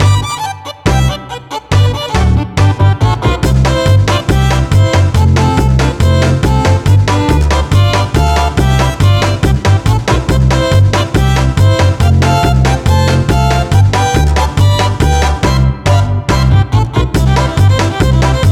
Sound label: Water Balloon Music